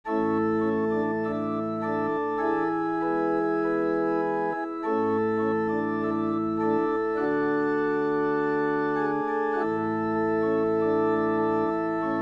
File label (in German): was kein auge kirchenorgel